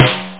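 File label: piccolo snare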